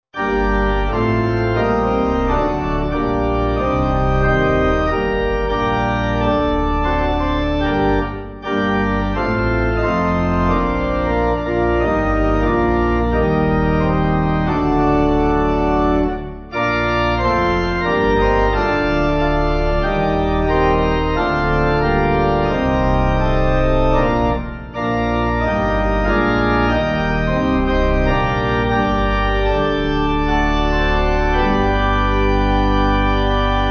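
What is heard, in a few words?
Pipe organ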